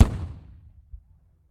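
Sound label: quiet almost silent shot with a silencer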